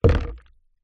household small plastic container with water in down of surface